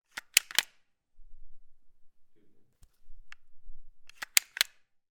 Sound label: gun carl gustav m45 swedish k; still north media